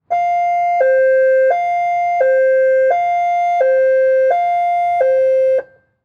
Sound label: Auto Police Car Siren; Transportation